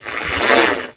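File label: droid dead; Star Wars Knights Of The Old Republic I; XBOX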